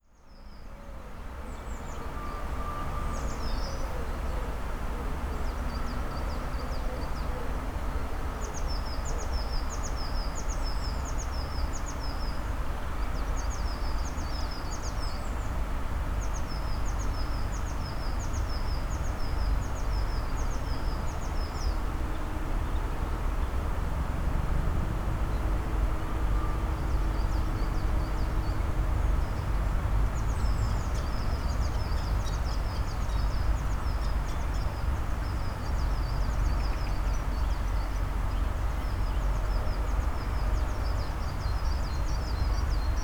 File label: ambience